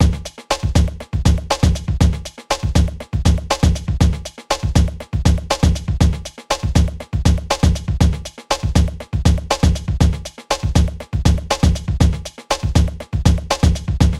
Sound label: beat